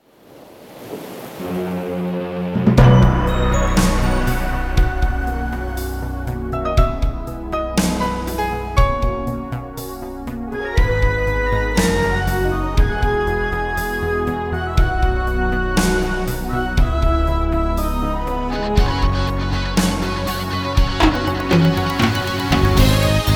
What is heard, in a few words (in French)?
pub musique navi adsl